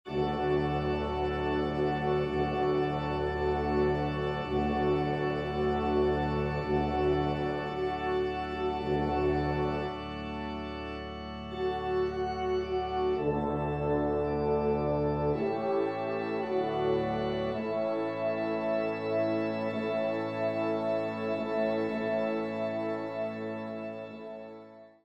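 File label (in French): Trompe Orgue; messe cantin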